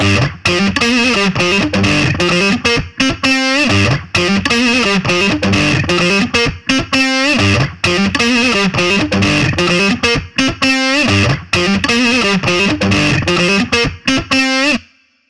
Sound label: guitar